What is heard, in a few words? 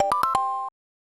Objectives Menu Close; BS Zelda; SNES